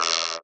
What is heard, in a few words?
Vocoded Drums; RadioactiveSandwich - Homunculus